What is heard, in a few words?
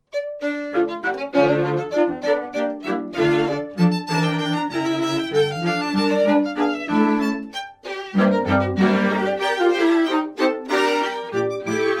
quintet